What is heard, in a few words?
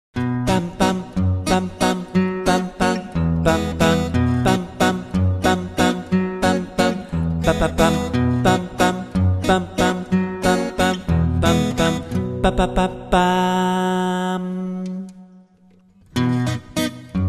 rythm